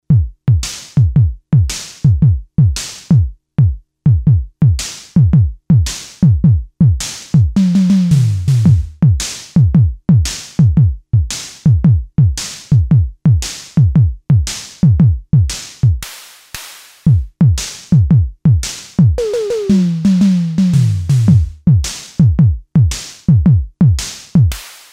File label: Tama TS-305, Manual